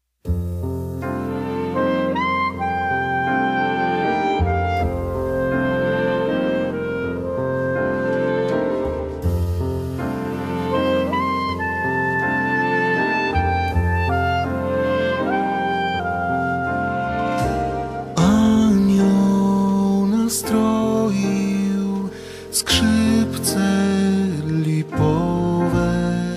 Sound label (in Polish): Forte piano, płyta Mazurek